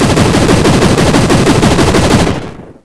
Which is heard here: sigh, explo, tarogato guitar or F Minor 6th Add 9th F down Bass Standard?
explo